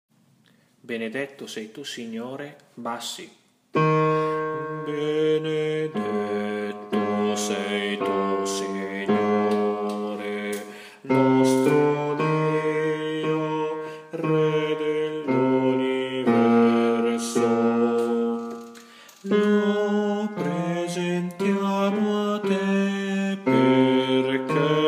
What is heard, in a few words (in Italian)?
Bassi; Benedetto Sei Tu Signore